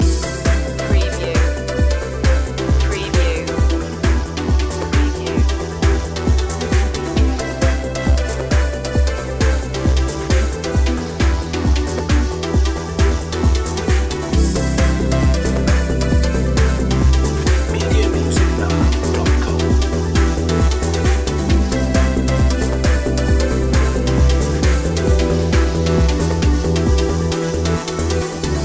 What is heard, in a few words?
ground pulsation loop